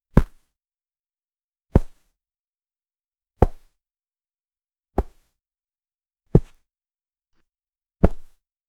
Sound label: object hitting the palm; texture